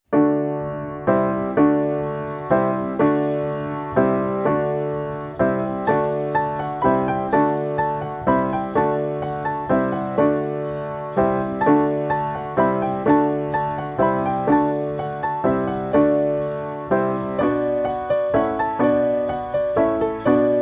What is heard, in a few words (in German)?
Karaoke Kommet ihr Hirten